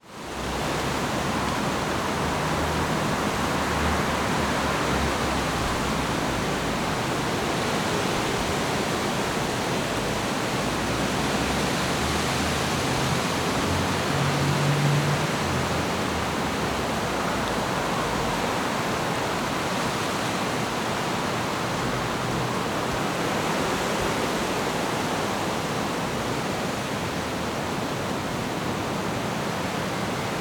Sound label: nature wind trees strong park traffic in background